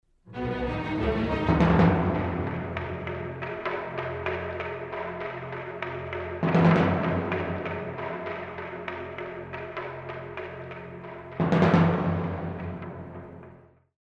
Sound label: lost round; music kits custom